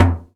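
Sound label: SP-12, drum machine; Best Service Gigapack 2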